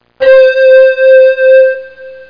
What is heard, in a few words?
Flute; Electronic Whole Earth; MUSIC